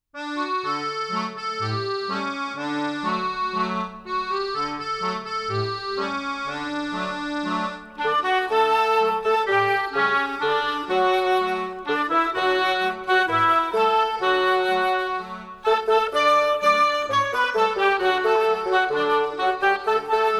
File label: music only; our door is always open